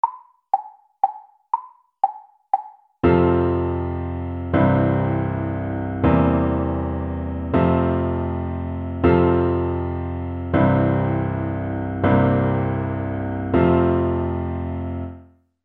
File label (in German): Westminster Glockenspiel schnell